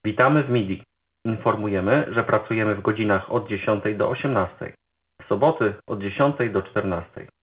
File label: Midi